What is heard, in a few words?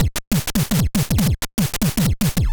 break, drums loops